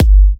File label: G, Kicks; markus hakala